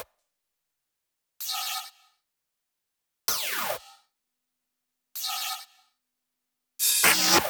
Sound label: 128BPM Kit; Vengeance Samples; Amplify Kit